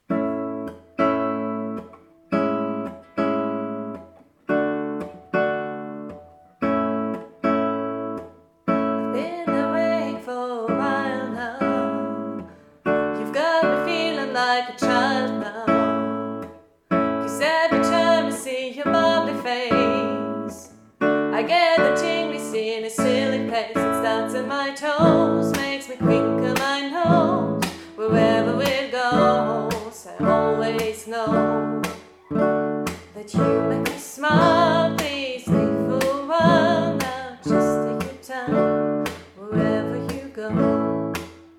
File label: bubbly, gitarrenstudio